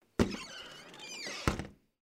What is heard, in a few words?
out Room